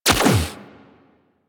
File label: Crewship Turret 3D Fire; Vehicles; Crew Ships; Weapons; Warframe; Rail Jack; Corpus Crew Ship